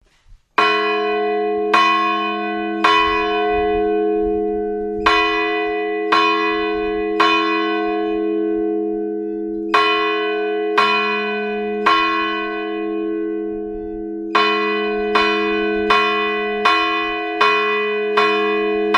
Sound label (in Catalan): ave maria, toc, campana gran, Sequència: 3 vegades 3 tocs, pausa i una tanda de 12 tocs; campanar; Josepa – Francesca